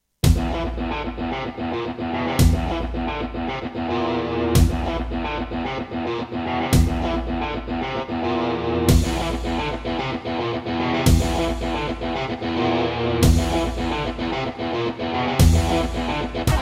Roland SD-50 GM2MODE; Doom

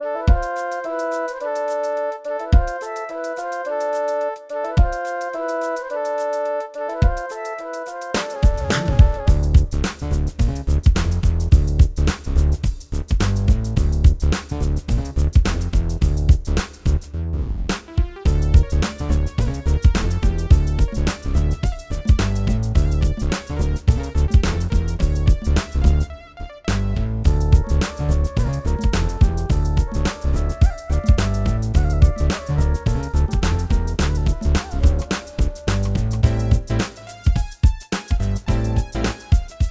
Yamaha SHS-500; Lost Vikings

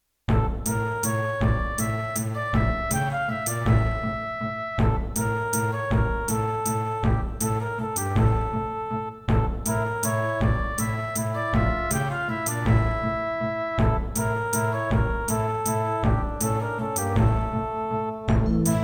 Serdaco Dreamblaster X16GS NATIVE

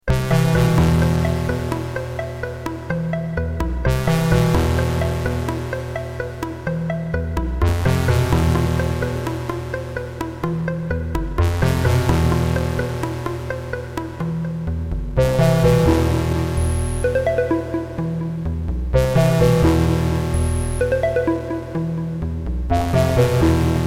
Roland CM-32L

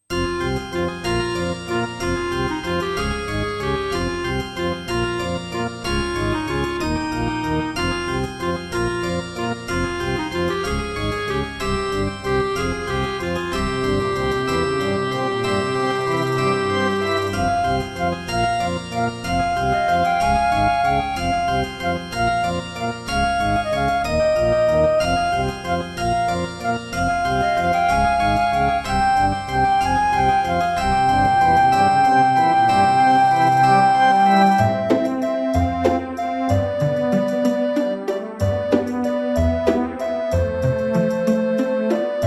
Turtle Beach Rio; Death Gate

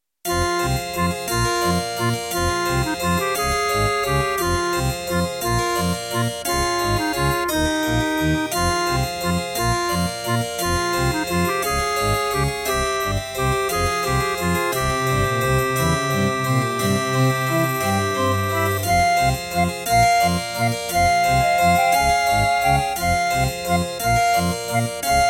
Miditemp DoX-1; Death Gate - Track 6

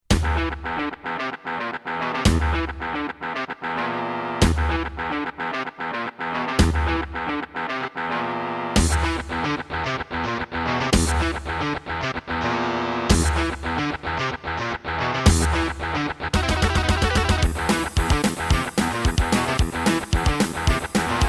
Roland SCC-1; Doom

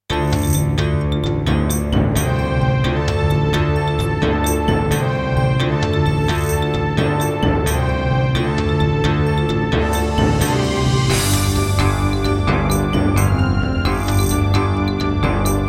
Roland SC-88 55MAP; Heretic

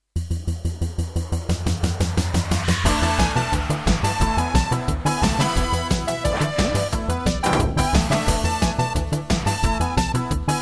Intro Roland RA-50; Willy Beamish

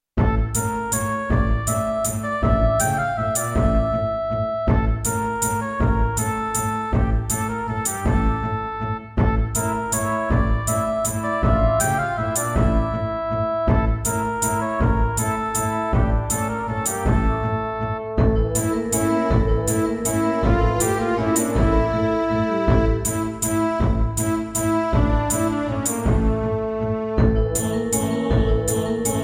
Yamaha MU90

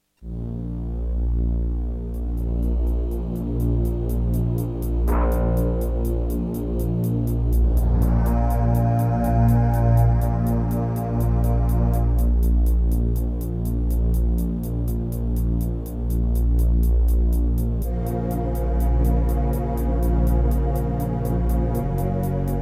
Intro [Terratec MiniWaveSystem SOWT-11]; Dune II

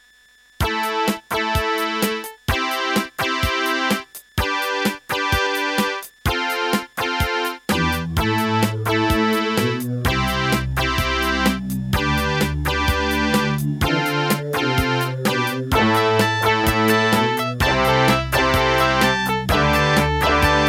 Raptor - Wave 1 [Ast Advantage DBII A]; raptor call of the shadows

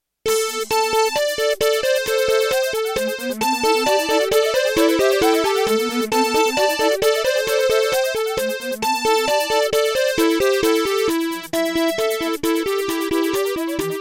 MidiPlus MiniEngine USB; System Shock - Medical

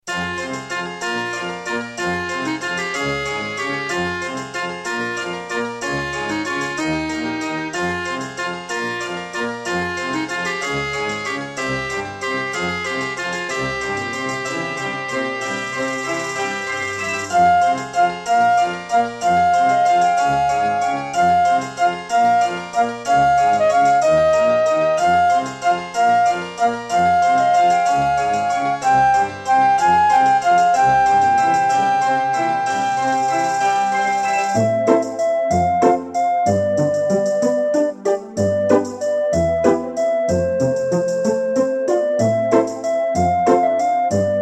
Akai SG01k; Death Gate

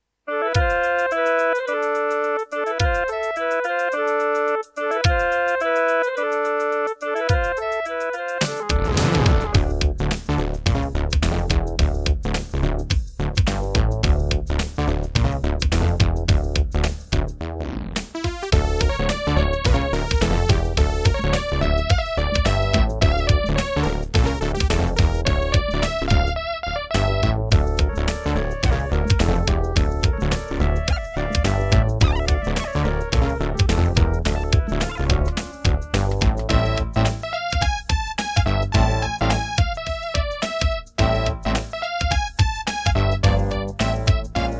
Egypt, Serdaco Crystal Blaster C1; Lost Vikings

